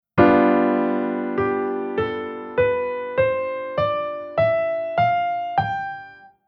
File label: Chord Chord Scale Major